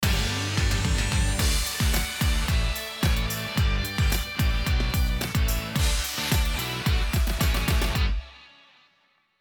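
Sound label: Quest Success Animation; Equestria Girls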